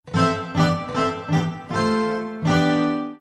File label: Guitar Riff Msg